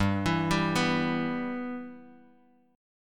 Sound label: G-6th-G-5,7,5,4,x,x down Guitar Drop D